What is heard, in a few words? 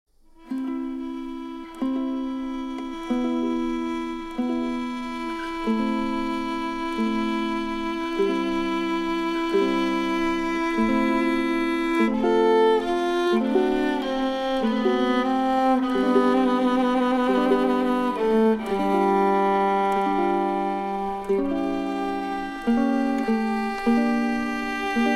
Air On The G String, kawai